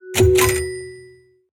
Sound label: payment transaction successfull